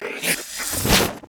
die

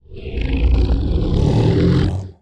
Infected beast war idle

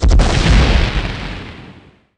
Flamethrower Fire Cast; bullet

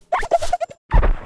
die

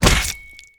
Staff Scale, Damage

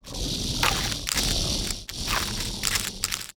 VOLCANIC GRUMBLE WAR IDLE

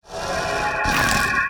VOLCANIC GRUMBLE WAR POWERUP

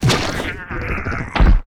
WAR DIE, Mob

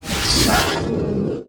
VOLCANIC LAVA WAR ATTACK

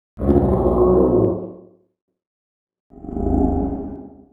Peace idle; Dagon; Mob

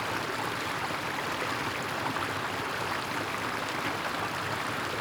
River, ambience

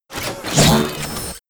BLADE WAR ATTACK; Mob

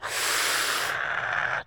Lizard peace walk

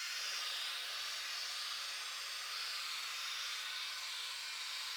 steam, ambience

